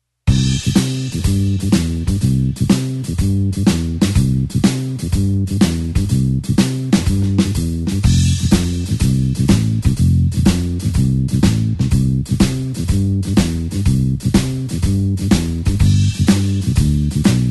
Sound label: blues bassline shuffle C